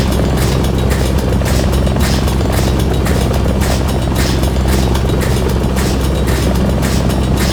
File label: Windmill Loop; Warframe; Duviri Murmur